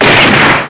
explo

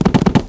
car stone; ARCADE; SRACER

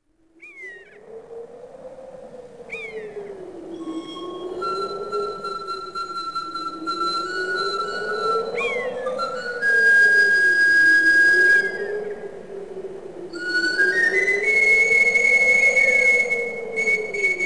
GLOBE; Compton's Atlas of the Ancient World

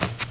Cut; iPoker 2000

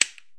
klik; Jablko; skladanky

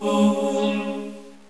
choir wire; Fantasia

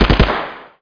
AK47 3 shot; SpecOps2 MPlayer Demo

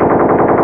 mg; TacOps

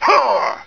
UGGH; Pirates! Gold

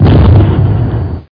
bowl boom

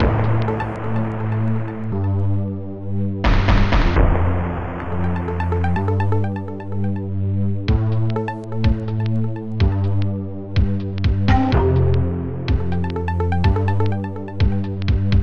rain

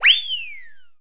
High Whistle

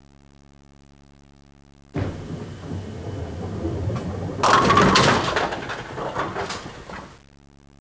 strike, bowling; WAV Sound Effects; sports

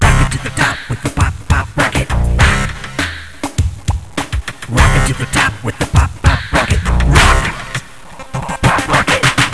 Pop Theme

